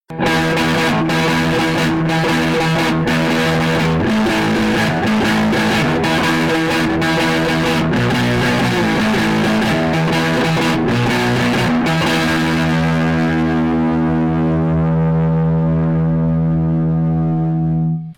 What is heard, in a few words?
JCM800 Lead Hot